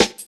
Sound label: Drum Loops, 165.5; Jungle; Zero-G Jungle Warfare 1, 2 & 3